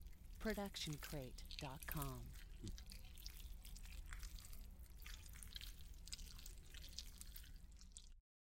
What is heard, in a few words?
stylized squish; soundscrate